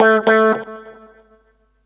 ROL GTR; USB Soundscan vol.31; Miro s Grooves; AKAI

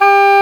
Oboe D'amore; Roland L-CDX-03 Disk 1; Oboe 4-8

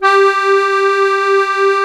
HOHNER, akkordeon

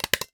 Stapler; Hits

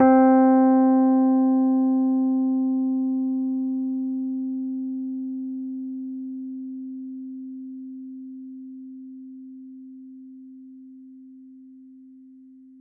C4, Rhodes Mark II; mkII 88 key MID; CEPCo Sample Series Vol. 3

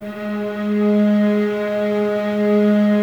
STR VAS G#, Violas, No Vib; Miroslav Vitous

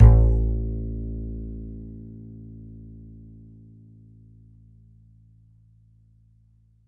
E, E string upright bass; Larry Seyer

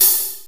cymb, cymbals; Drum Hits

